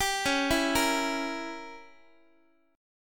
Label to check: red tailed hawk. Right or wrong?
Wrong